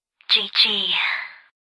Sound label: ringtone